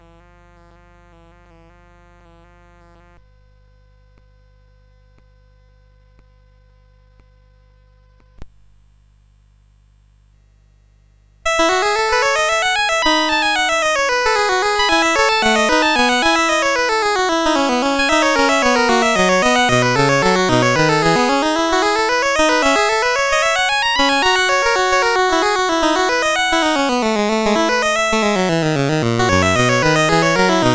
Courante, CSG8580R5; Joseph A Grau; Sixth French Suite

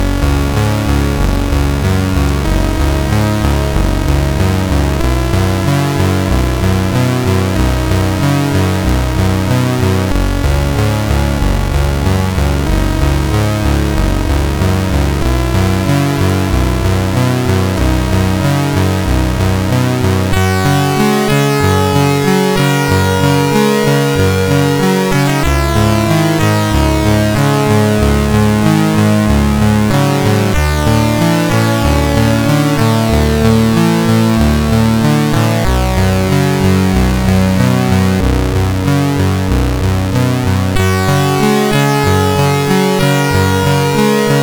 Mini Melodies, SID CSG8580R5

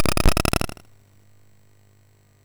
SFX, MOS6581; Narco Police

